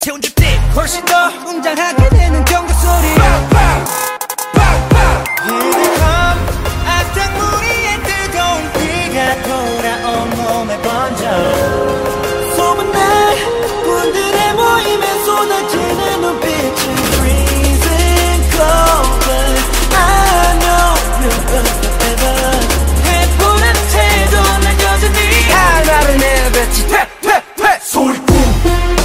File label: Thunderous telefon zil sesi